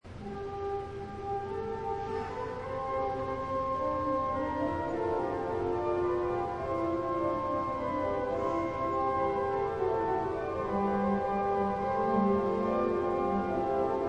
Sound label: Organ interlude; Colloquium; Vespers